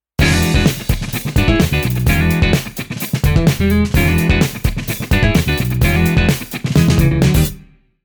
guitar pattern

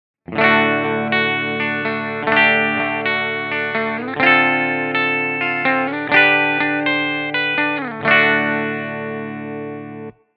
guitar Jazz Amp

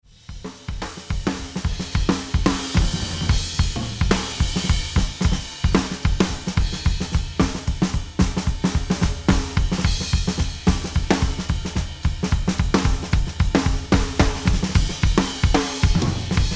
Drums Kit Mic

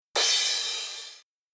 Rhythm House Sample Crash

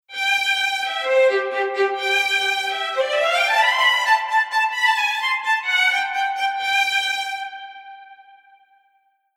Strings Layer

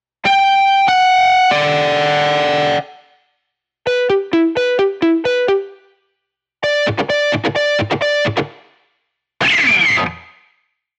guitar articulation

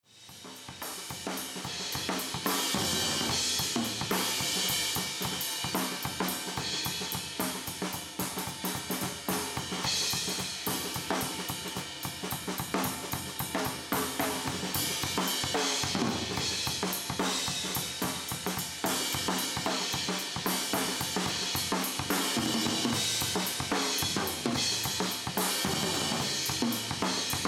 Drums Kit Mic Limit On

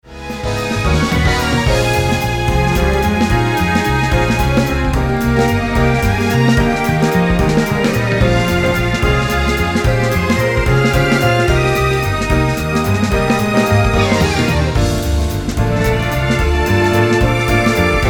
Jazz Waltz Strings

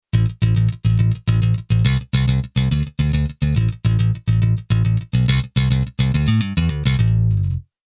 Bass Arr Metal Bass

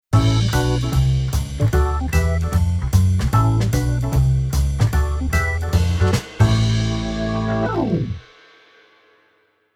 keyboard organ jazz

